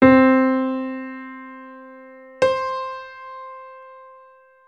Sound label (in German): oktave, gehoerbildung